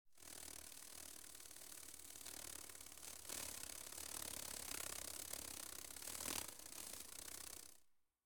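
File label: ambience elves; wingsofriches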